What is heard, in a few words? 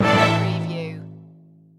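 Casino Slot Machine Orchestra Stab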